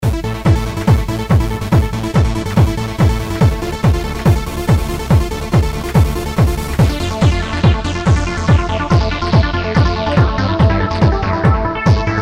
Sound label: Trance Lead; Combinator; Propellerhead Reason 3.0; Velo-City